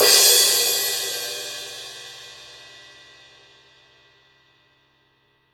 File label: CYM, Real Drum Kit, FUNK KIT